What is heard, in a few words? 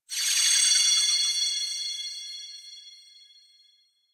system complete; anemoi